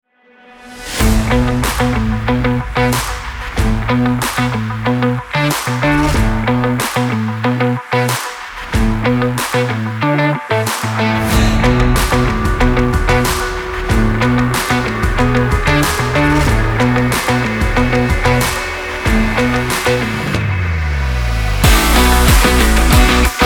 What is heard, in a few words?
energetic, rock, action